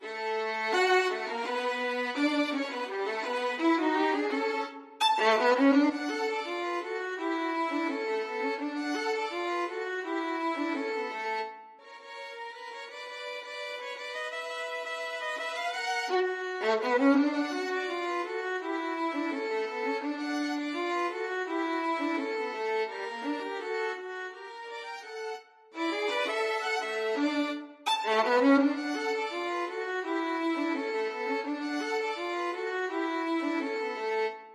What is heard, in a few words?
violin duet